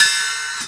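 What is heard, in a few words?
hi-hat hit, roots reggae; Sounds Good - Roots Reggae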